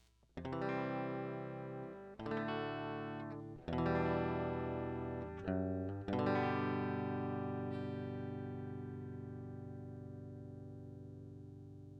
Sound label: guitar chords dry